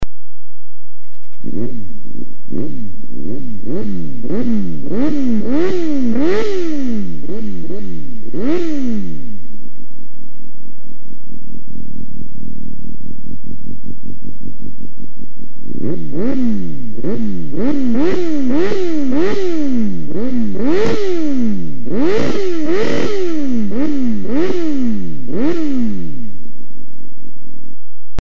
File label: bandit 600, Suzuki